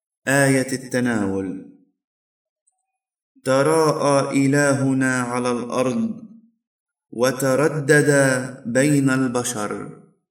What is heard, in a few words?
Communion Antiphon; Holy Family